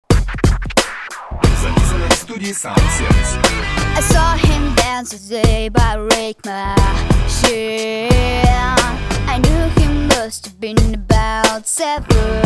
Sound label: rocknroll